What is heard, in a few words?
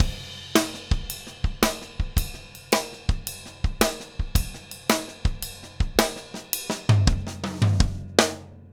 TRIP, 165 TRIPLETS; HI BPM; Steve Gadd Drumscores